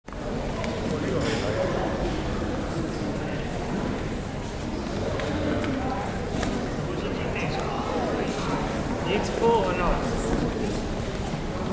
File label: National Museum ambience crowd milling and lively